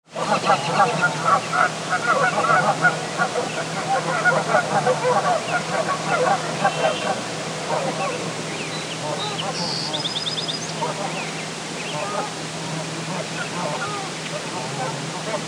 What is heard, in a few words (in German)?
Vögel